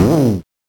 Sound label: Vroom; Genesis